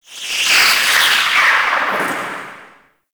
Hazard Spark Projectile; Gameplay; Stadium; Warframe; Nineteen Ninety Nine

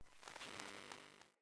decoy cardinal static; Thawtide

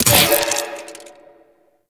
Mech, Weapons, Sentient; Warframe; Shedu

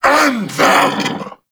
Vocal Player Spotted; Warframe; Duviri; Dax

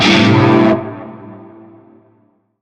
Shawzin Maj Chord K; Music; Warframe; Narmer

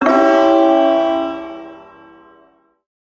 Ersatz Shawzin Phrygian Chord E; Music; Chords; Warframe; Duviri

